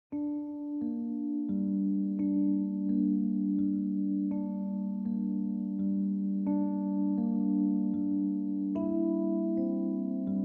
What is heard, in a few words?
clean soul relaxing background music; Heaven Forest VR MMO